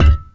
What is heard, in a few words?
physics cube impact soft